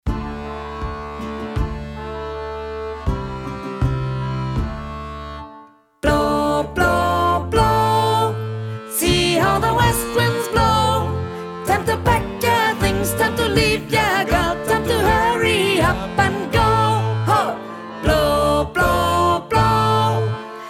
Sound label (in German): Sopran